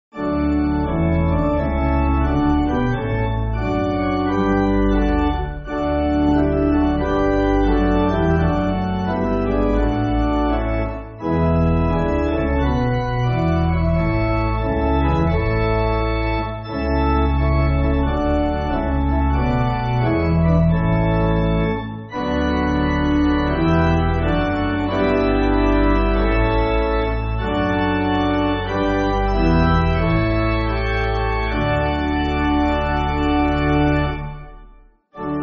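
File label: Pipe Organ, Backing Files for Churches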